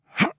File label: Warrior Grunt Jump; Realm Royale